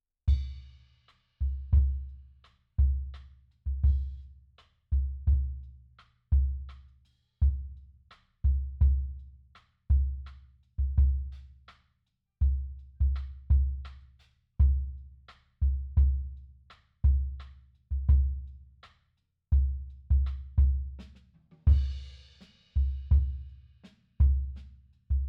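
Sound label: bass drum In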